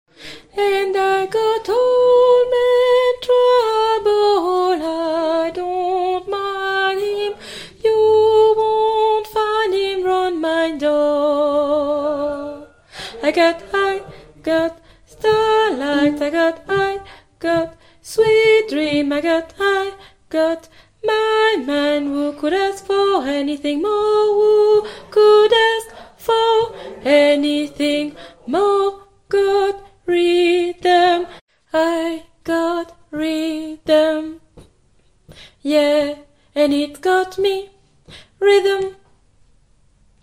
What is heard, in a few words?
basse